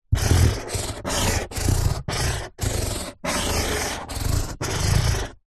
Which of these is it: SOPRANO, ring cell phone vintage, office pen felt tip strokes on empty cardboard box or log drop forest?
office pen felt tip strokes on empty cardboard box